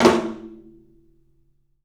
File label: TOM, 3D Drums, CLUB TOM; 3D Sound; CLUB 08TOM